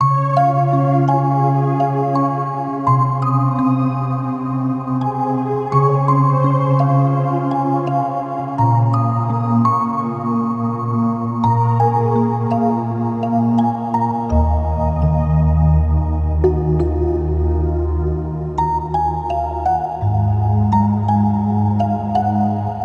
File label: Chorus Melody C 84BPM